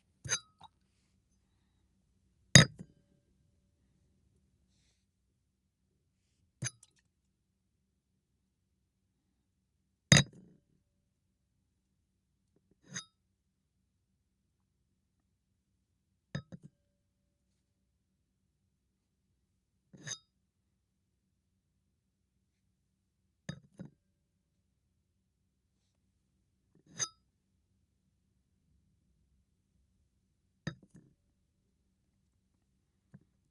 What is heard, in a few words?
cup; fvtt sonniss gdc audio